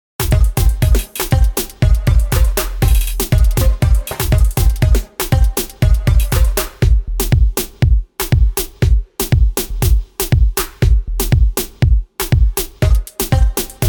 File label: Groove Agent 5